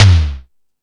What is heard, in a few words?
Dance, Drum Machines